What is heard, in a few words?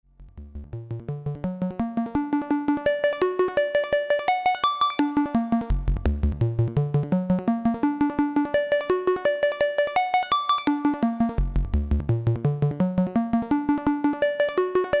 Serge Crocodile DSG Tracking